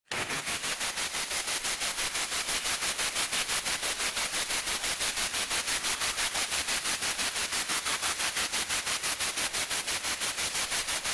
Lawn Sprinklers, sprays & hoses; Lawn Sprinklers Steady

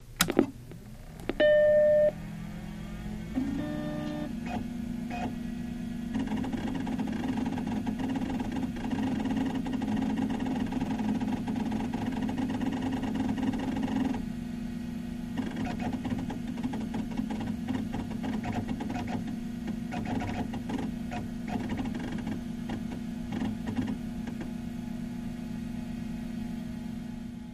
Apple Se20 Switch On

